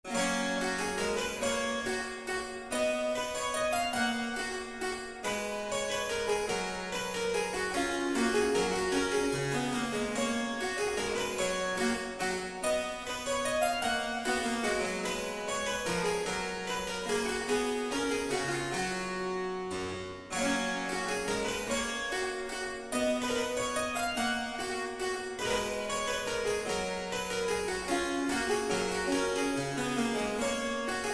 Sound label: Sync Wavetable Soni